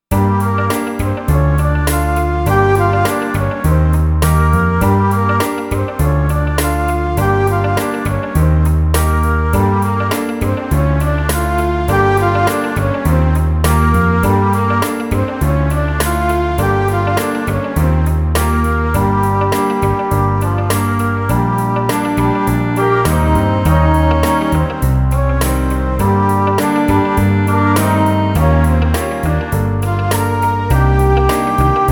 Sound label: Blues Rock in Gm Pop Ballad 102